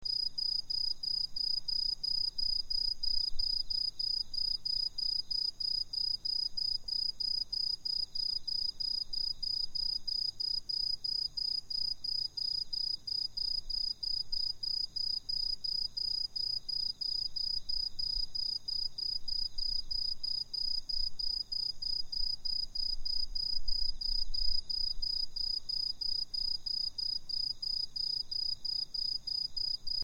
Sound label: insect